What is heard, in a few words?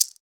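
MARACAS; Roland LA; ETHNIC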